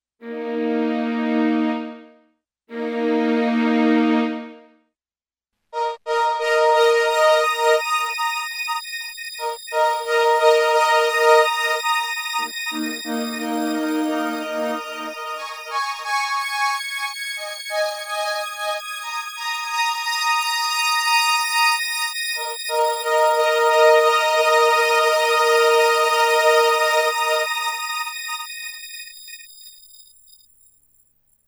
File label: High Swells